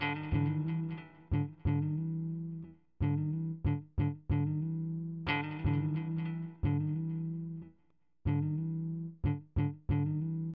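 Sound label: guitar, G, Hip Hop & RnB; Makin Moves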